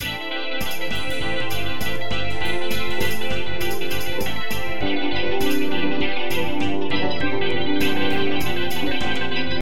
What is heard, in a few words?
Rock